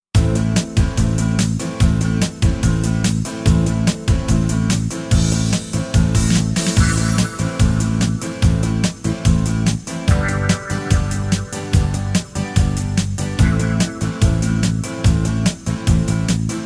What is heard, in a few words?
brass minus